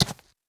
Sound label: horse footstep dirt hard; Rust